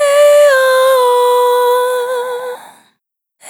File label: Single Voice Melodic Loops, Vocal, Dry